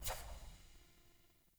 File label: UI Default Theme Grid Open